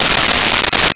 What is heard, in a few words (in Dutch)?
servies, home-made